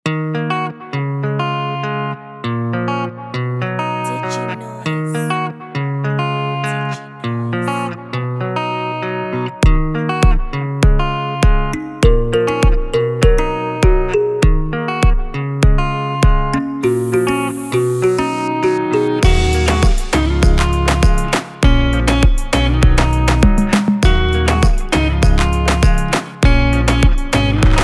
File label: Afro Pop Guitars; Diginoiz